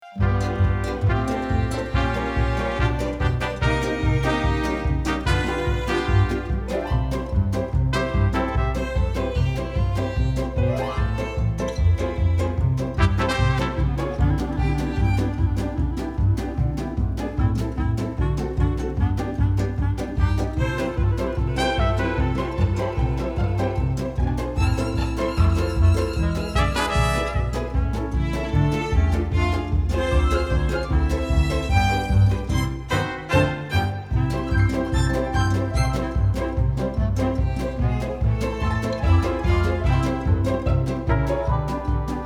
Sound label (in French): mix instru